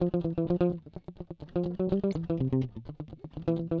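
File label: Latin Jazz Guitar, Fmin, 126 bpm; Digidesign; Pro Tools Essential Loops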